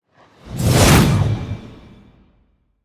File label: Thrower Wipe; Ninja Tracks